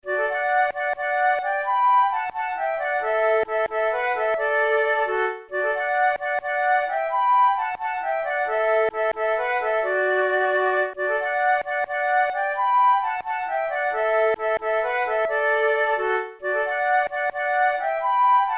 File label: duet